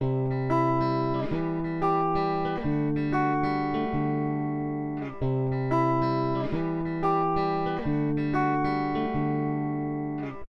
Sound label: reverb room; guia de pedales de reverb